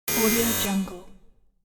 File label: error buzz